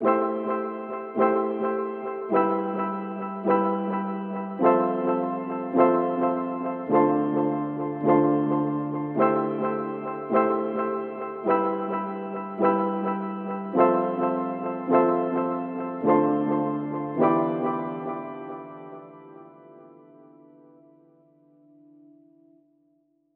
105 BPM A Min Piano; Cymatics; Gems Free Teaser Pack; Cymatics Gems Vol 6; Toronto

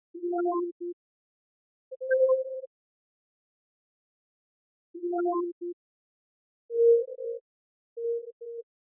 Various Melody Loop - 100 BPM A# Min Warble; Loop Stems; Cymatics; 2020 Melody Collection

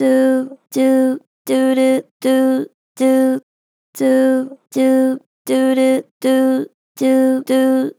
Gen Vib Do Do, Vocals, Natural Vibrato Gentle; Harmor Vocals

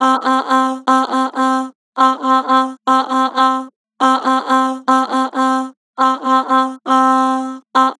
"Aah", Harmor Vocals

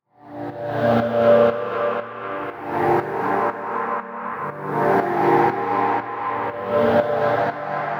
Pad Drone Loop Gm, Dub Techno; Loops; Ghosthack; Ghosthack - AC2023 - Day 17 - Dub Techno & Deep House Chords

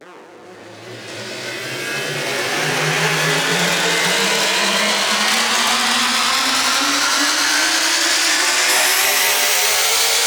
Murkiness Riser FX, Dubstep; One-Shots; Effects; Ghosthack; Dubstep Sounds 2025

